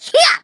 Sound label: Char Slide